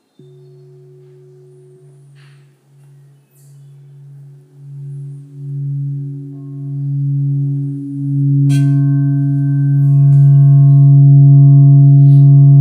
Bol Clair

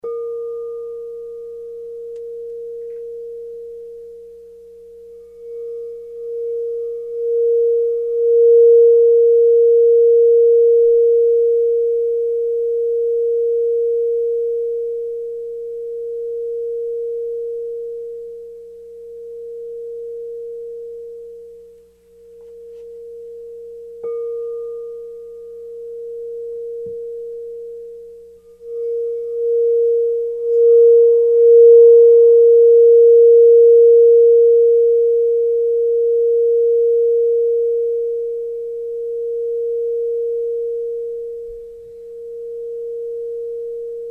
Son Chakra A; Singing Bowls

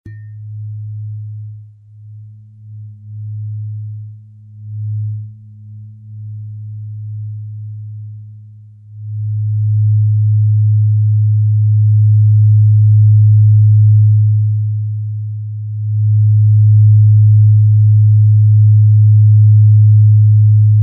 Diapason

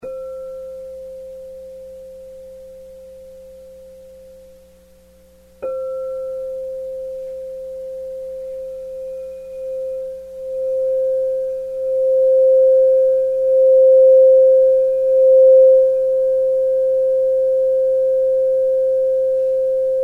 Son Chakra C Bas; Singing Bowls; etoilescristallines

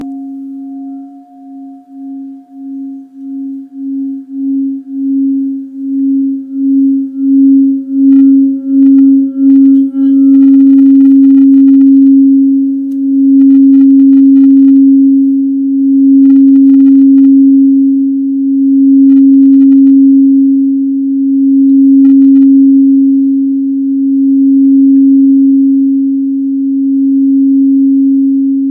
Bol Gris Moldavite

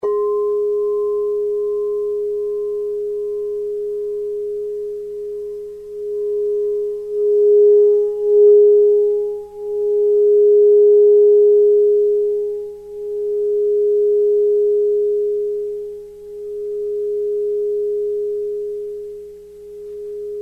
Son Chakra G; Singing Bowls; etoilescristallines

